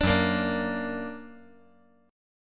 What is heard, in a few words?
Gb Augmented